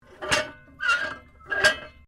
MECHANICAL ARMATURES METAL CREAK, Metal arm movement; Creaking Sound Effects; Creaking (Sound Effects:Objects)